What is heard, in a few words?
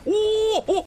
oh hoh